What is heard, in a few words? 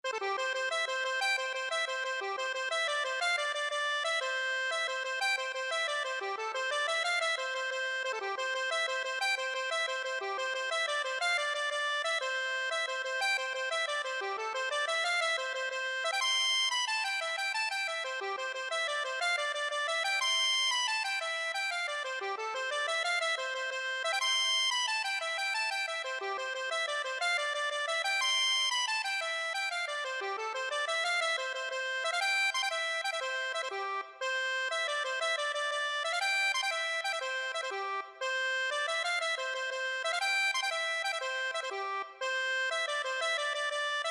accordion